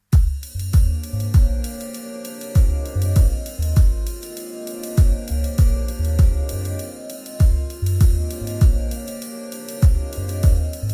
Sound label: drum circles; hip hop